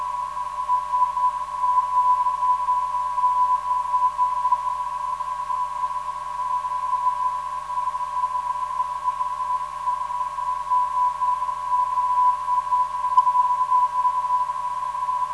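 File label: 414kHz, non-directional beacon; SLB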